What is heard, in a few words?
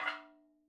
madalam notes L